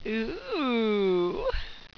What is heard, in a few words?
eeww, sultry, pain